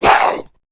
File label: zombie vox male pain; tf; slender; rave in the redwoods